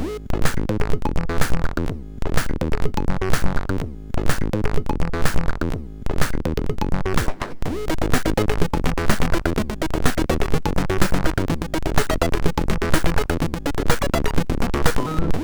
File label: sid, MOS6581; MOS6581R3